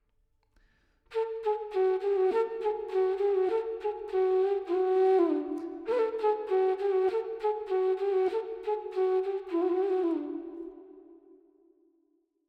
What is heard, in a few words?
Verb 4 4 D 100